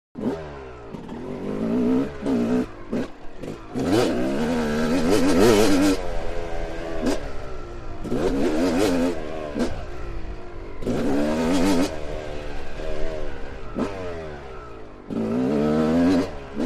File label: Internal Mic In Bike Racing Around The Track Shifting Thru Gears, ( Some Wind Can Be Heard, It Was Not Rolled Off To Give You The Wind Effect ), Motorcycle Motocross